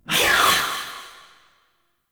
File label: Unyielding Gallop Cast Vocal; Warframe; Dagath